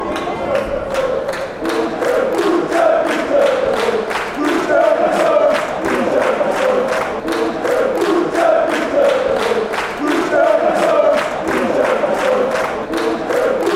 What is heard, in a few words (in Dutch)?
zingen met klapt